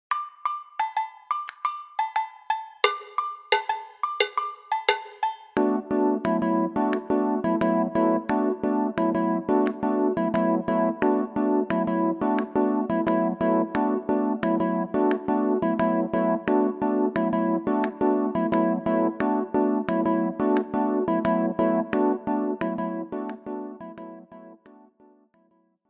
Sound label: agogo